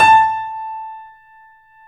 3D Boesendorfer, Pianos, A; E-MU Producer Series Vol. 5; 3-D Audio Collection

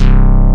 synth bass C1

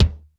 DEEP K1, BRUSH KITS; Crypton - DrumBase5000

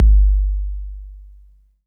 808 tape kick clean

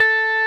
Roxichord A 4, RMI E.Piano; Keyboards of The 60's and 70's; Roxichord 1

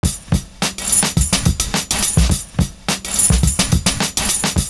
SWING, loop; Univers Sons - Kitch 70s

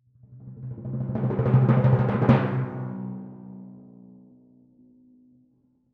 Crescendo-hit C, Timpani; PowerFX; Downtown Orchestra

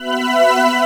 FAIRLIGHT; Greytsounds VOL-1

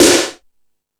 Hip Hop, Drum Machines E-MU Pro-Cussion

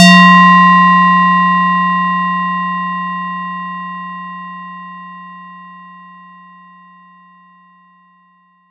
FX RINGR; ModelCycles

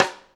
Snare, Drum & Bass; 90 s Sample CDs; Total Drum&Bass

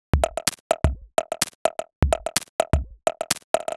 Top C 127bpm, House Drumloops; Zero-G House Fabrik Drumloops; ZG Drumloops

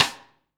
DR RIM3S; Best Service Gigapack 2

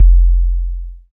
BAS FM DX-7

